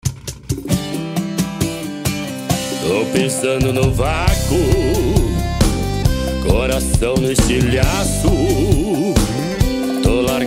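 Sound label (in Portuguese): especial sertanejo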